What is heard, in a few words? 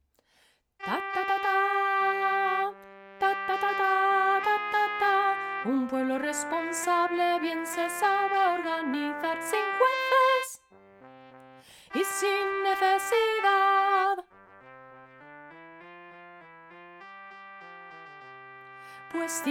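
soprano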